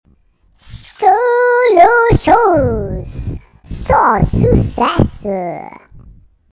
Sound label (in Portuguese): vinheta